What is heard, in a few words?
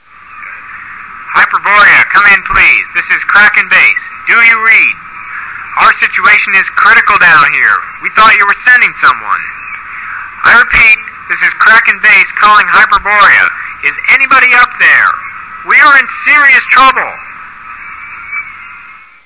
radio; kraken radio; hl2